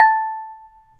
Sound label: a clean dry audio recordi, musical instrument